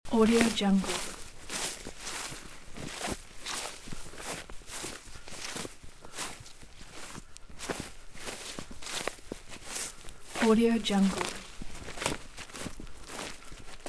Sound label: wading through forest in rubber boots loop; human sounds